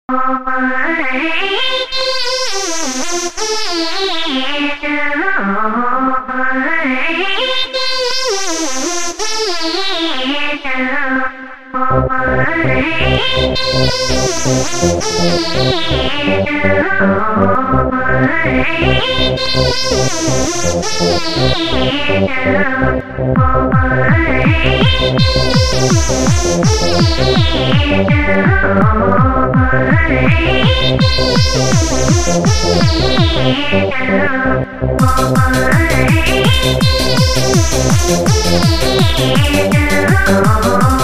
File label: Happy Hardcore